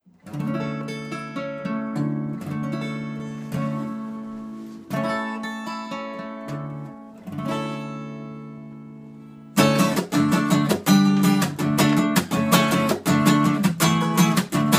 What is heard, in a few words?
Acoustic